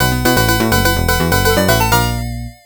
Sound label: Heart Powerup; The Addams Family; Genesis